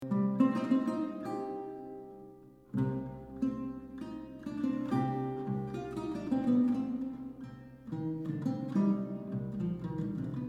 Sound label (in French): luth renaissance 10 choeurs, Toccata; frei; prunier; Frescobaldi